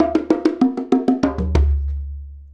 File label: Bongo Fill; Drums; Bongos; Essential Percussion